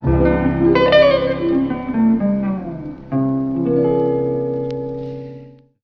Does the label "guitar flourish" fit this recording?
Yes